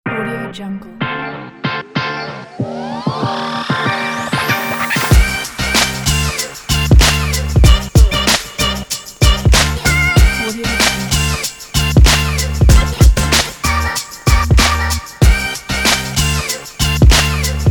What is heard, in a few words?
hiphop